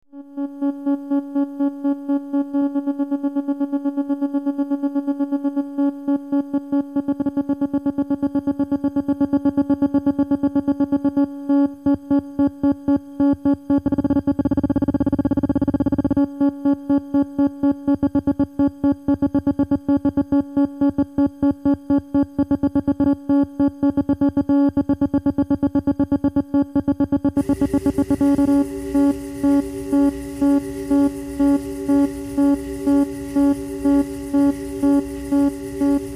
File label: theremin; ghostengine